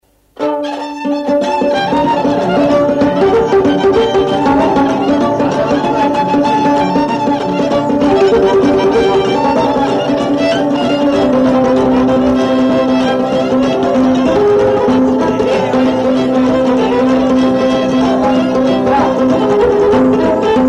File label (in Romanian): Clopotelul taraf; jocuri si cantece batranesti; Vaslui